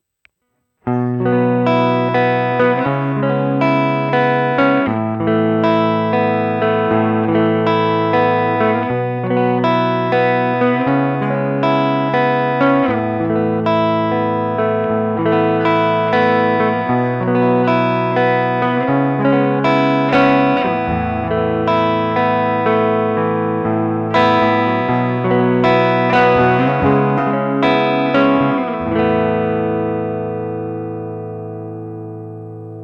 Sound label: proco rat2 lespaul